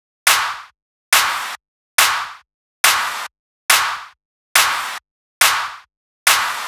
drum Loop 140BPM Clap

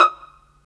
Vas Pizz; STR Vlas Pizz

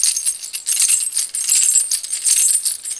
Apache Shaker; Sony Creative Software; Hip-Hop Street Beats Construction Kit; Voices of Native America

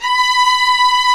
STR, Vlas Sect Agitato; Vas1 Agitato; Roland L-CD702; Vlas Sect1-2

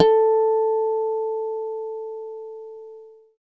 GUIT Harm; Best Service Gigapack 2

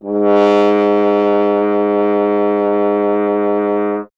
F. Horn; Miroslav Vitous; Solo Instruments VOL-2

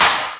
Claps; Vengeance; Essential Clubsounds Vol.4

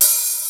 MPC 60; Rubber Chicken Software Sample Bank; DRUMS + PERC; AKAI

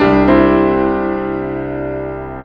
PIA, PIANOS; USB Soundscan vol.02; AKAI

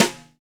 New Jazz Snare; Snares

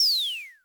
Dual-Percussion-Synthesiser; Klone

